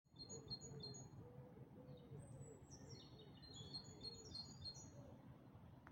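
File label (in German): Dawn Chorus, Vogelgesänge vor Sonnenaufgang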